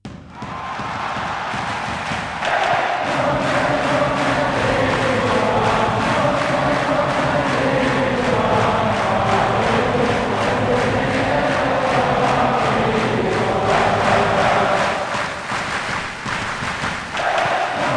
Chants England

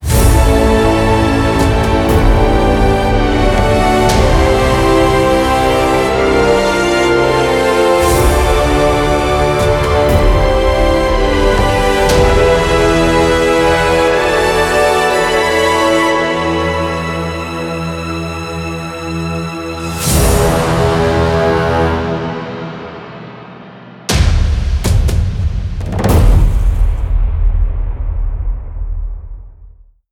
Victory Anthem; asterix obelix xxl romastered

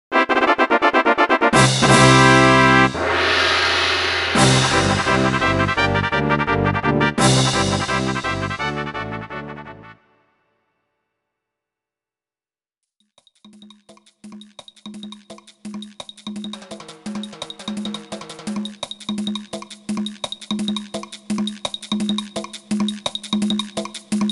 Kickball; Banjo-Tooie; Mayahem

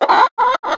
Chicken

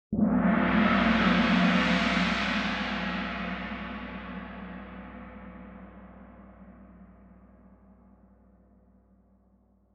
Gong

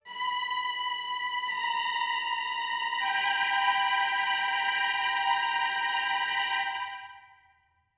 strings

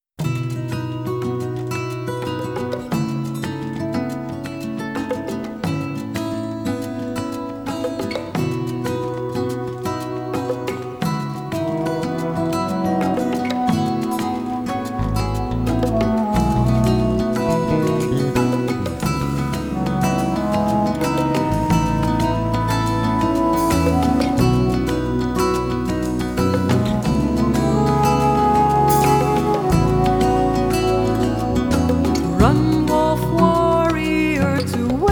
(Instrumental Fade Out)

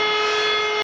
Vehicle Tank Turret Turn Loop

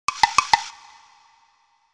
Collect Clockwork Kazooie Eggs; Banjo-Tooie

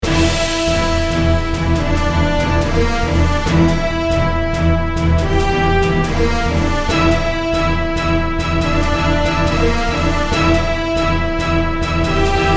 Action; Mothership

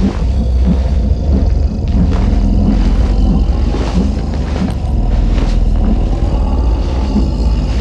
Dragon Flight

